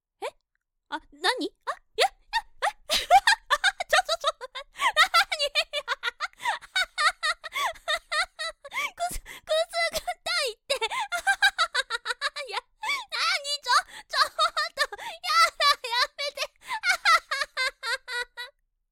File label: tickle